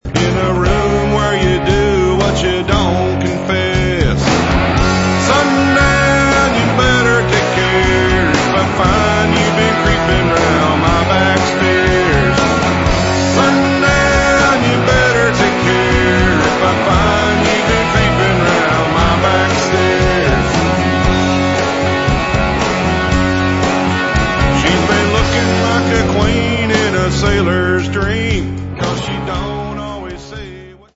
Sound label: Song 33rpm